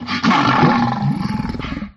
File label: cry; okidogi